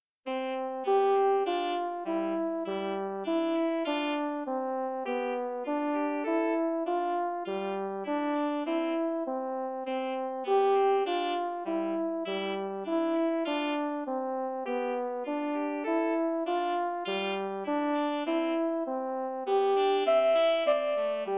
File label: Sententiae for Saxophone and Horn; Three Sententiae for Saxophone and Horn Op 289